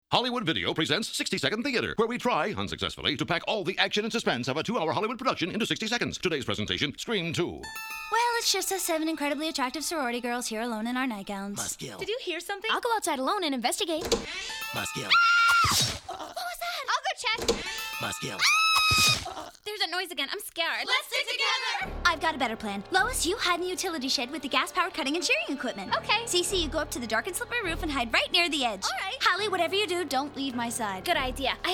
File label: Scream